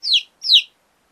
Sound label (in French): Poussin